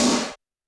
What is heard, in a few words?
Snare, Roland TD-20